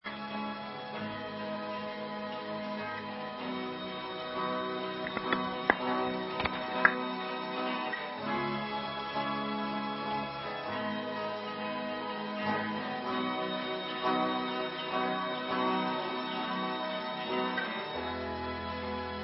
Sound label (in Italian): canto per te